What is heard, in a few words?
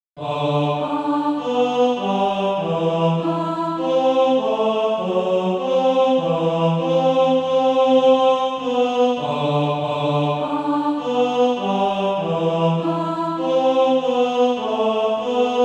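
tenor, SATB Practise Music; Cleator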